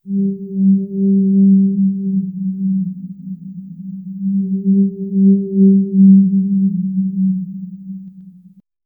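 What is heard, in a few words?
dreamy horse hum soft melody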